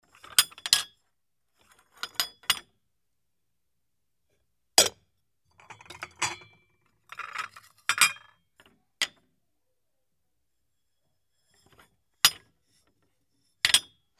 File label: dish